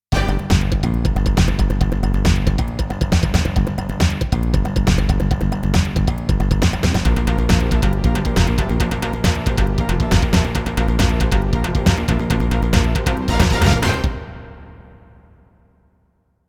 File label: CM32L